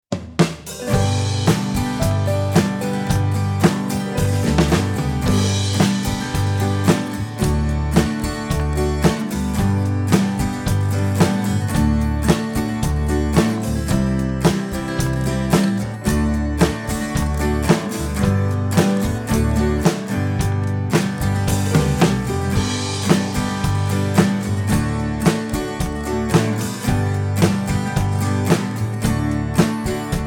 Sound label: instrumental; Glerárskólasöngurinn